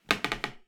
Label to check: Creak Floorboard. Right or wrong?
Right